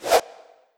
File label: Fruit Launch; Legend of Keepers (gamerip) (2019)